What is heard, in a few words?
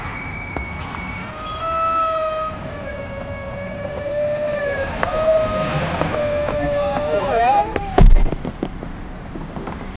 people urgently getting o, traffic